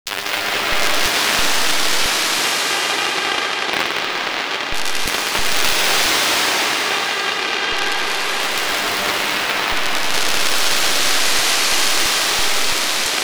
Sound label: Resonant Rain; 3 per sec; indonesia; sysson; Institut 17 Elektronische Musik und Akustik